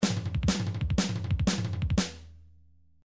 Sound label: drums fill, midi drum patterns